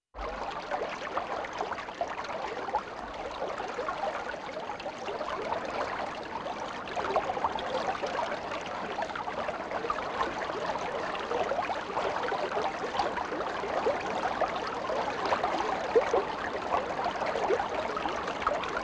small stream brook

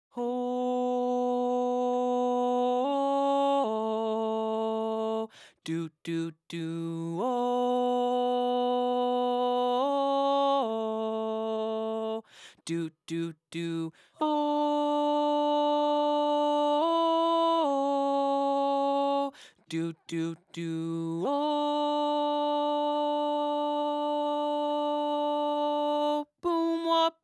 Tenor

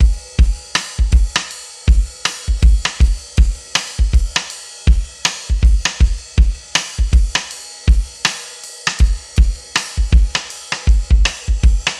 80 Processed Drums; Live Trip Hop Drums; Loops; Smoke Signal

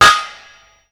BANG; tools Bangs

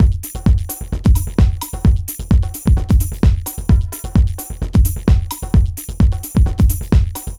Club Dance Beat

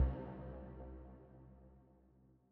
112 C Bass Tail, New Age Ambient Loops; Tail Samples; Spring

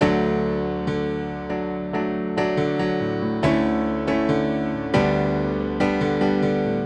Classic Rock Piano, loops

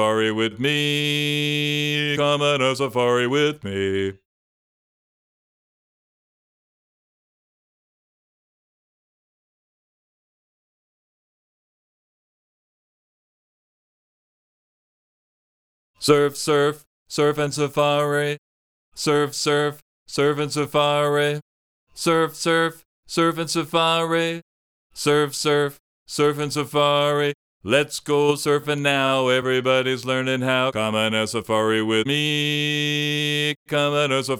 Vocal

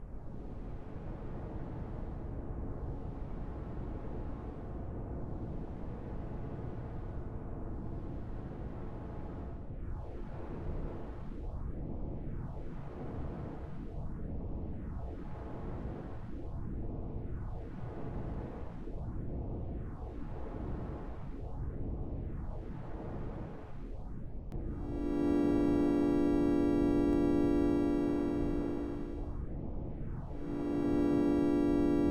A Spaceship Engine Failure; 384 Sound Designs

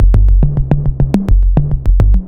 808 Loop Toms 105, Roland 808 Drum Loops, 105bpm

